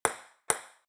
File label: ping-pong ball, clattering